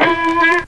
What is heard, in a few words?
twangy